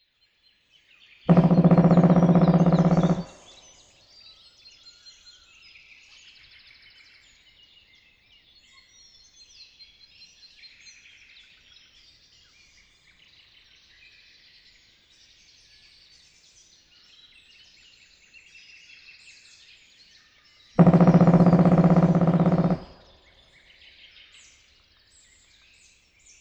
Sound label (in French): pic noir; oiseaux de nos forets